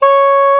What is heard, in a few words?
SOP HRD C#, SOPRNO SX